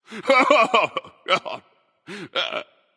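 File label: nonverbal boohoo; smite; voicepack; merlin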